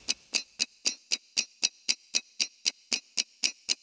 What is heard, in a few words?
Clock; Seer; GamigoNA